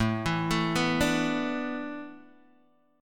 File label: A Suspended 2nd Suspended 4th, Guitar, Open G